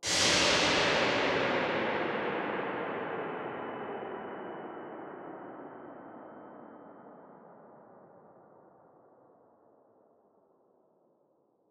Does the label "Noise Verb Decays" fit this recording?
Yes